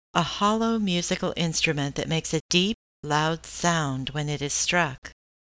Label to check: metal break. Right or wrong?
Wrong